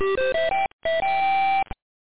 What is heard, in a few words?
CHARGE; wincastl